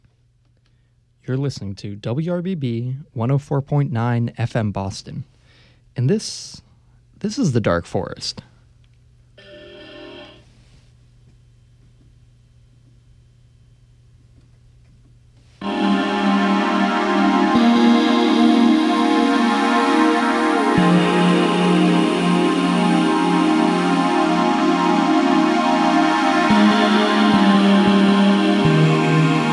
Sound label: dreamdance